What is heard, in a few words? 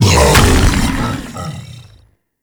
Bowl Stop A; Warframe; Grendel